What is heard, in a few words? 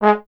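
Brass Trombone G#0; Miroslav Vitous - Solo Instruments VOL-1; 90 s Sample CDs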